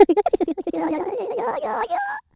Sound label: laugh; Pong